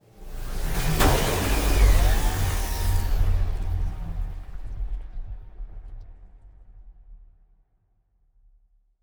ship transport ship move; Stellaris; machineage

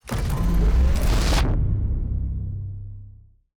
action activation construct lock; astral planes

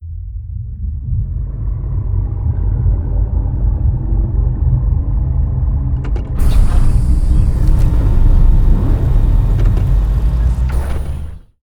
colossus opening; arthropoid; apocalypse

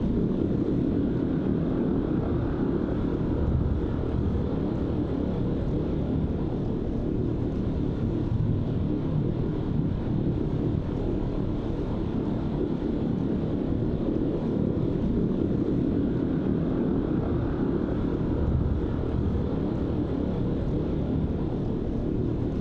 ship titan idle; Stellaris; machineage

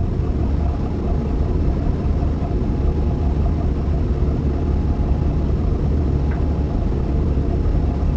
ambient space pirate large ship; Stellaris

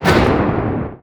mass driver hit, weapons; Stellaris

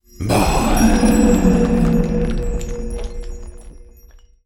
lithoid angelic; lithoid portrait; Stellaris

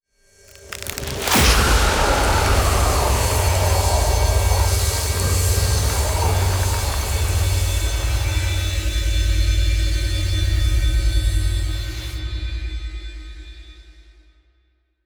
sfx relic activation; intact zro soulstone; Stellaris; ancient relics

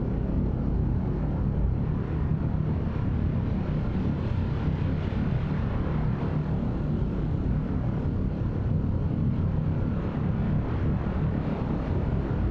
ship construction ship idle; Stellaris; machineage; ships

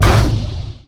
plasma hit; weapons; Stellaris

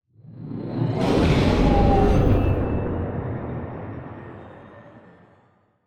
ship small ship move; synthqueen; Stellaris; machineage

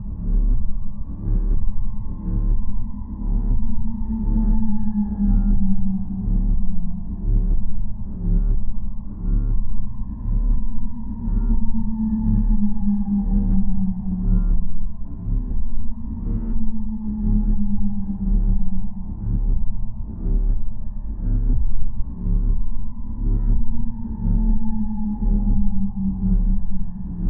idle pulsating; star eater; Stellaris; nemesis

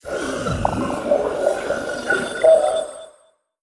alien signal; Stellaris

